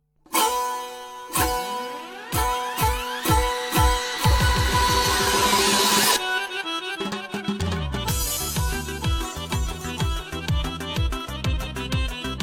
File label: Azeri Orchestral Song